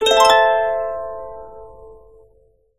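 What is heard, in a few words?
harp; Best Service; Peter Siedlaczek's Advanced Orchestra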